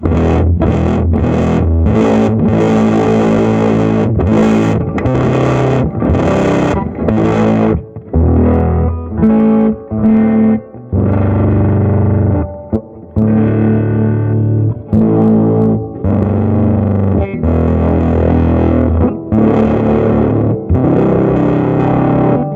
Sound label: different character settings, amps